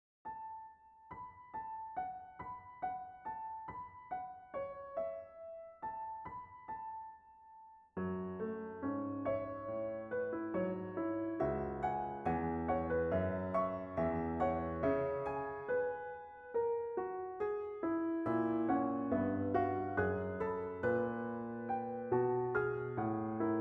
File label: three flutes piano arrangement; a short trip for three flutes; weeklybeats 2012